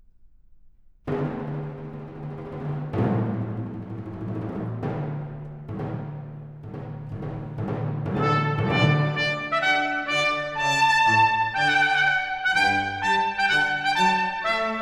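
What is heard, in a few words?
Prélude, for strings; pour le Te Deum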